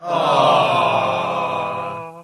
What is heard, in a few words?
aaaawwww